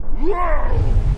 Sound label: Punch Left Speed Up; Thug; Pitch Black - A Detective's Demise